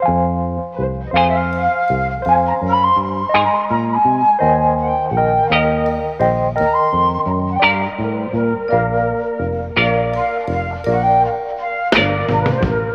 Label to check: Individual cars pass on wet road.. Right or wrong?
Wrong